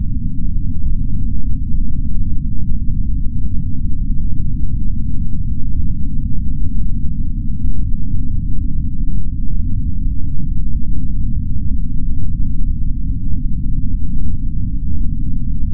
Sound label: Runway Rumble